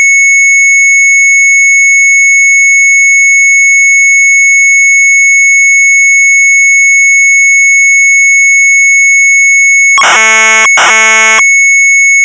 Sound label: MZ800 Tape, sharp; NAV.LUCHTVAART; retromelsarchive